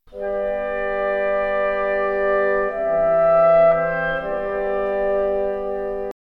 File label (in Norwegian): Dvorak cellokonsert sats2; Hvilken operare begynner slik